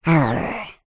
cartoon character frustrated urrhh; zapsplat

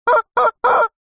cartoon chicken cluck loud; zapsplat

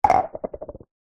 measuring cup small plastic set down wood surface; household; zapsplat

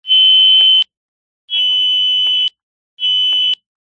cb radio feedback burst high pitched speaker pov

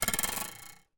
cartoon bounce diving board; zapsplat

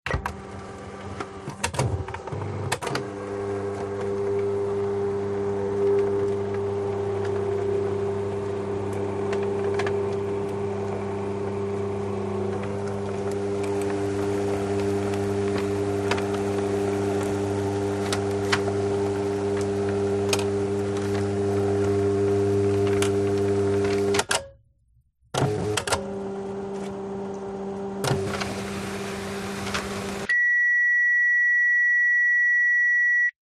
Fax Machine

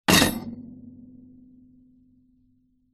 impacts metal pole hit vibrate; zapsplat

